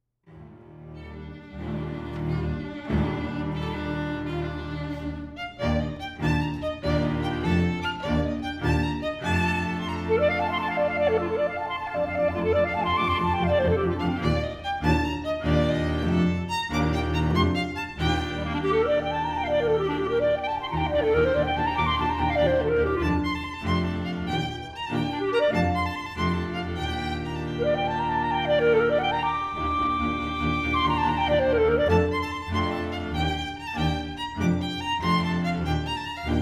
Divertimento for Clarinet, clarinet and string quintet